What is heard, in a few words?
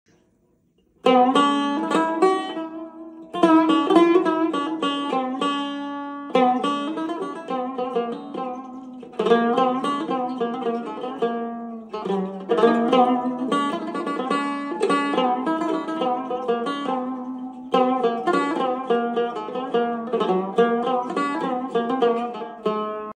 Cümbüş